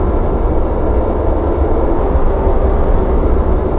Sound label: Ambient